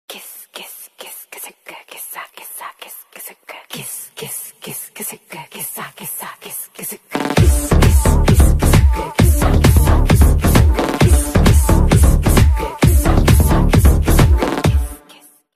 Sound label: song bgm ringtone; pushpa 2 the rule